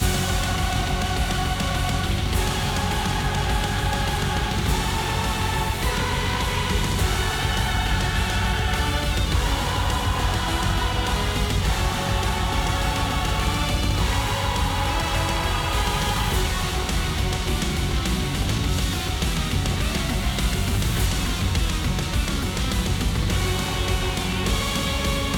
Battle Boss Gatekeeper Cerberus

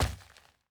dirt land

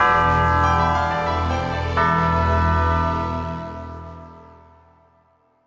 game lose; Silent Sign; android

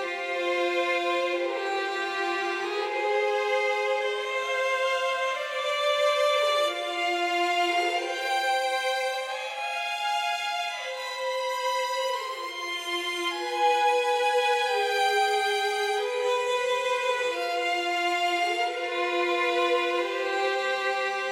Violen; Toki Tori 2

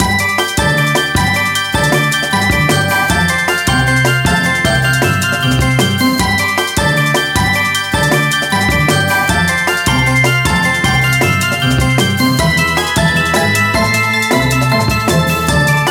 reveal music Princess; Spanish

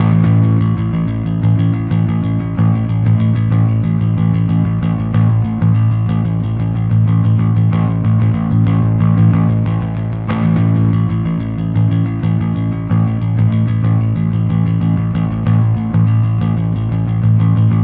New Rock Bass; 93 Refurb

